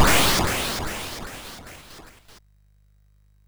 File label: sid, MOS6581R2; hvsc; War Cars Construction Kit